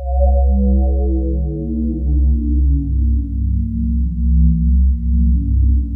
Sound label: BELL STEE, ATMOSPHERES; Sound Gallery - Charged